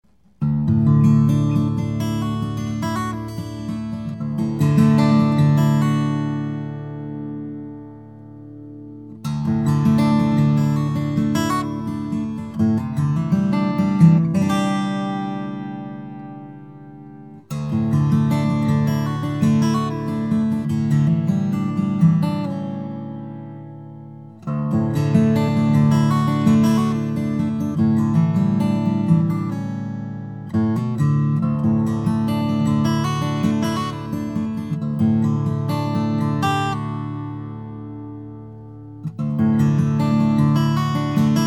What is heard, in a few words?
Guitar